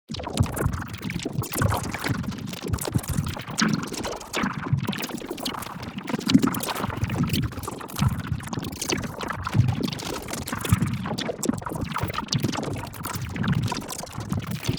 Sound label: PARTICLES LARGE FAST Plasma Core; NEON; boom library